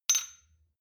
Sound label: shot glass clink soft; berufe; soundpool